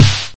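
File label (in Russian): малый барабан одиночный 909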